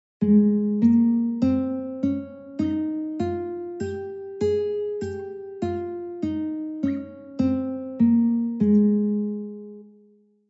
Ab Scale Guitar; California Music Conservatory

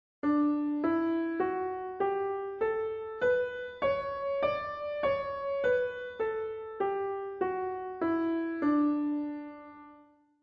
D Scale Piano; California Music Conservatory

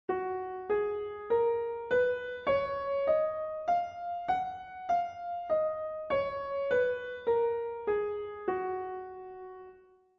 Gb Scale Piano; California Music Conservatory

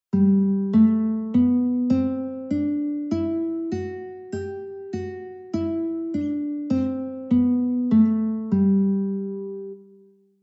G Scale Guitar; California Music Conservatory

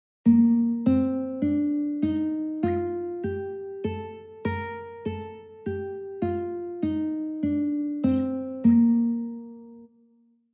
Bb Scale Guitar; California Music Conservatory

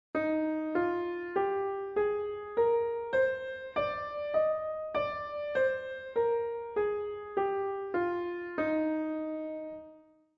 Eb Scale Piano; California Music Conservatory

